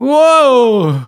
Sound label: voice male critical